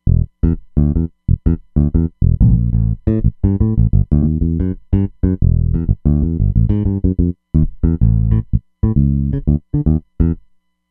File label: X Finger Bs1